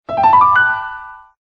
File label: New Sms